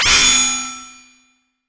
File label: metal; Free SFX for anything